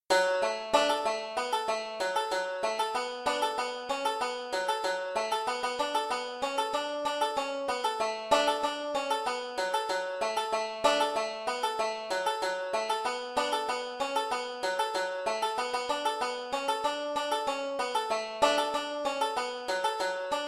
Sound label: whiskey in the jar solo, banjo; beginning 5 string